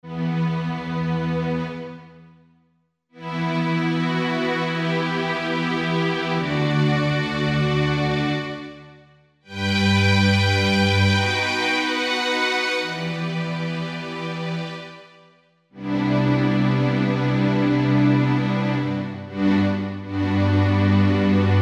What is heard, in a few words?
STRINGS Hollywood Strings; Apple GarageBand 2